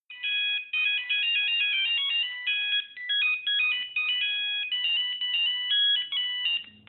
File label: nokia 1100 badinerie ringtone